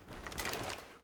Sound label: draw, weapons